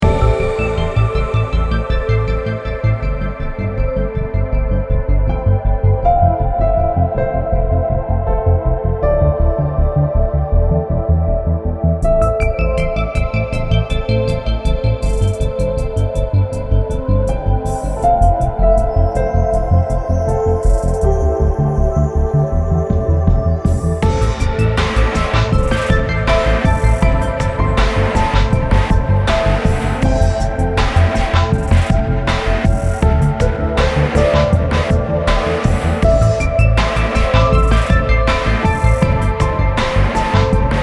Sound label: menu ambient